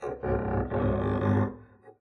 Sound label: arco noise creak, double bass; dsmolken; noises